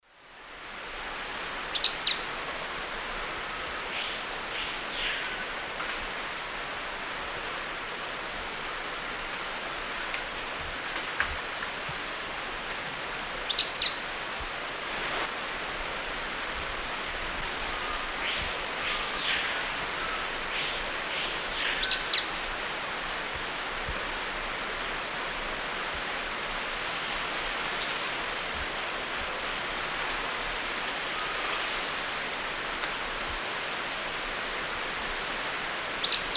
Myiodynastes luteiventris, song or call; S. America; Eastern Lowlands

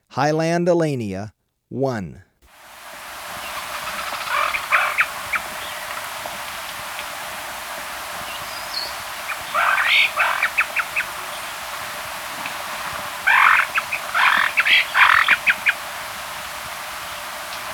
Highland Elaenia, Birds of Ecuador; S. America

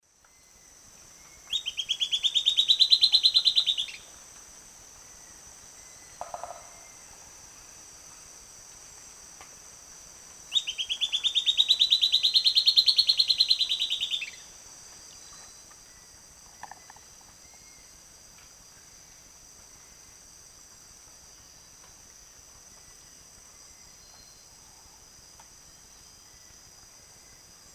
Sclateria naevia, song; S. America; Eastern Lowlands

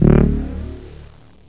MOOG

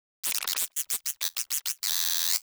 Scratch

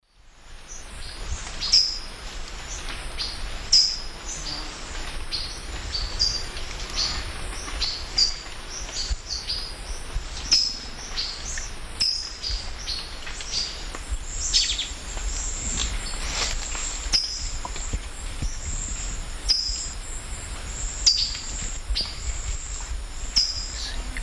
Ramphocelus nigrogularis, calls; S. America; Eastern Lowlands

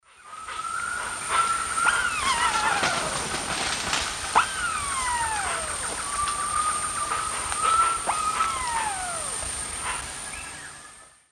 Crax globulosa, female calls; S. America; Eastern Lowlands

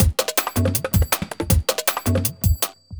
jungle juice 160

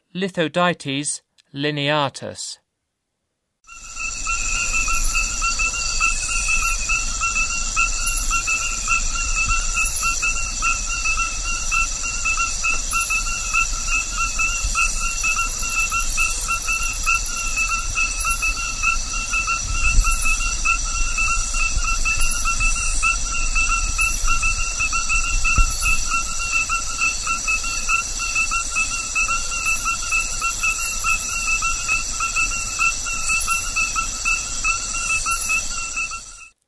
Lithodytes lineatus, Frogs of Ecuadorian Amazon; S. America

